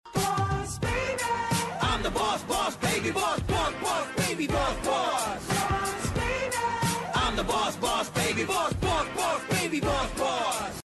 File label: theme song; boss baby